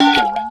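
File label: WASHEE BEL, percussion metallic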